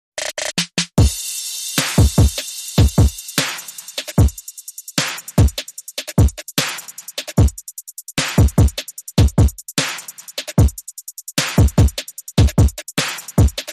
Beat

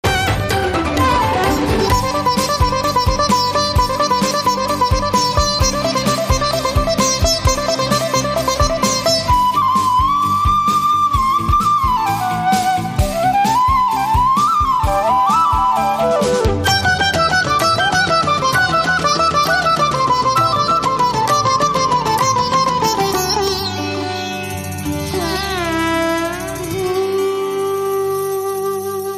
Punjabi Party

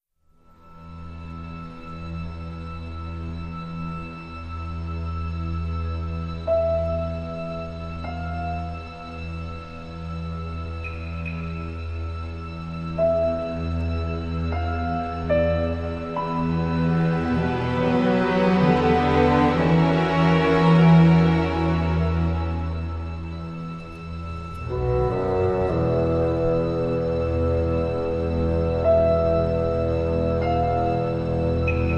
Calm

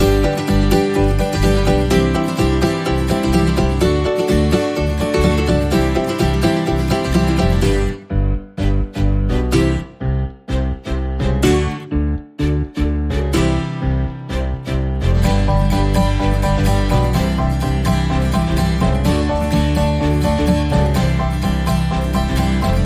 Happy Happy Happy, Loop No Drums No Melody; 20sec

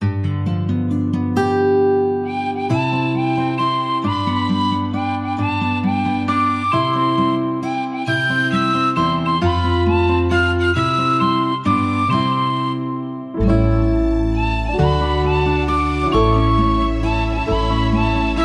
Penny Whistle Happy Birthday

